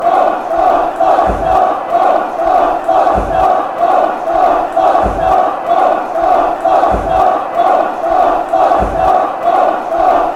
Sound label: off off off, fan chants